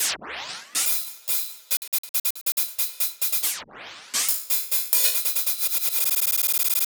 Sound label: Hi-Hat Esoterica, Beats; Sonic Foundry (Sony Creative Software)